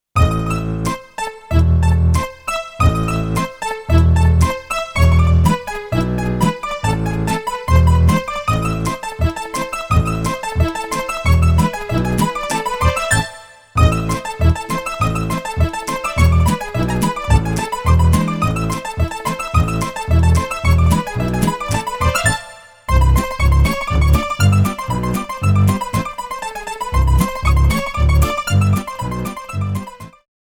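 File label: mandolin acoustic bass; Sonatter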